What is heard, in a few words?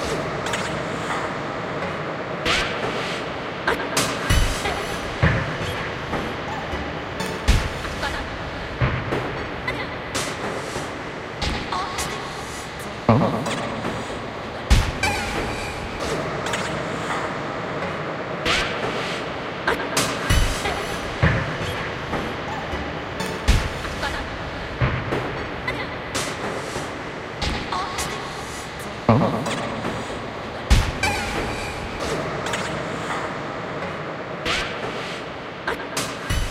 Music Loop; Infinite Hole; Music Loop 2